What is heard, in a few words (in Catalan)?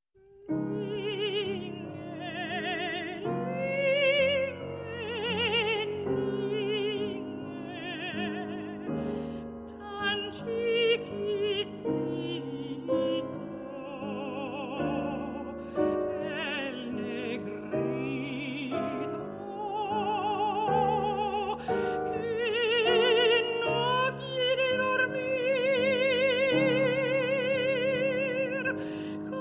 Veu Piano, Canciones negras, Cancion de cuna